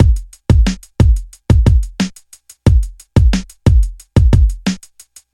90 bpm breakbeat sample g key, Free drum groove - kick tuned to the G note. Loudest frequency: 703Hz